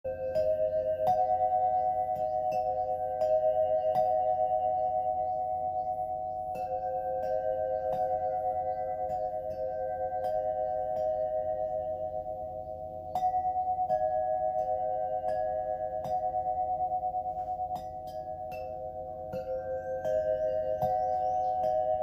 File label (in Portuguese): Triade Dominante